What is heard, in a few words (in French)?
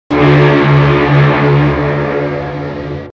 sirene de bateau